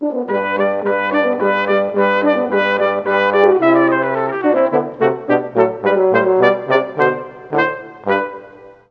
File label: Trp Hn Tbn; Juilliard Music Adventure